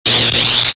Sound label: saber block; weapons